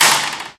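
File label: physics clipboard impact small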